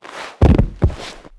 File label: Dodge; characters